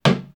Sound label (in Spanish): Cabezazo